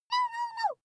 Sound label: child play